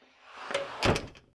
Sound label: quiet door close; soundscrate